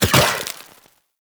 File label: Shot, Weapons; Moa Pet; Cryotra